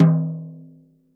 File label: rack tom - snares off; JazzFunkKit